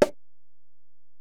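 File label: Acoustic Percussion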